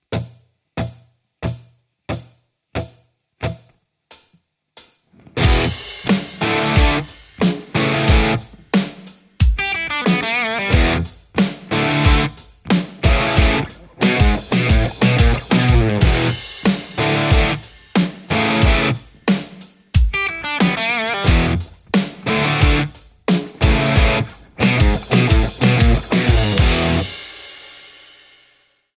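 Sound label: Telecaster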